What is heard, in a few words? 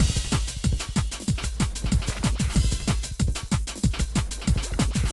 level won; zj the ball